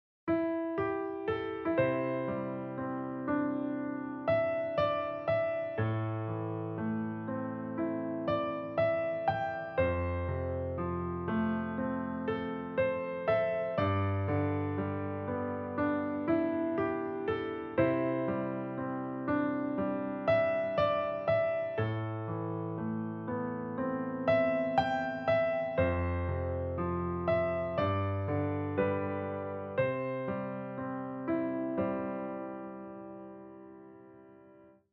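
warm and soothing relaxing short melody opening piano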